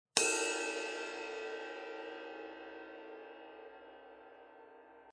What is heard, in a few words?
bow, ride cymbal; 21"; AA Raw Bell Dry Ride Cymbal; Sabian